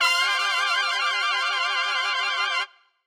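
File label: trumpet shake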